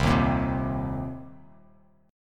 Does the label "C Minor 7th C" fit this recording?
Yes